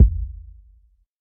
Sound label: large room kick a key, Royality free kickdrum sound tuned to the A note. Loudest frequency: 84Hz; kick drum samples